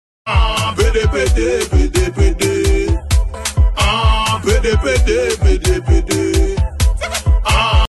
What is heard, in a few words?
pd pd pd pd radio music; meme